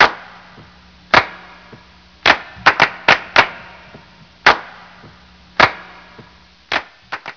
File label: car wash